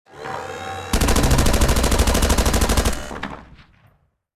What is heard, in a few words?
Skill Rampage